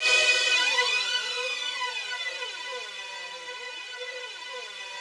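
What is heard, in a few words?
rough strings B3 right, Yamaha VP-1; 90 s Sample CDs; Music Store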